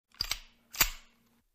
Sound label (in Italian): Caricamento Pistola